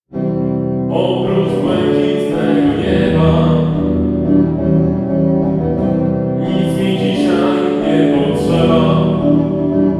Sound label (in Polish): duet reverb